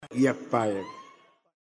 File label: fired